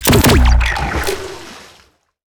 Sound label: atk water gun